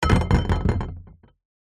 foley metal rod small hollow set down on plastic bin lid